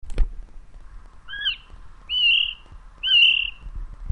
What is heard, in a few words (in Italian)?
canto Svasso cornuto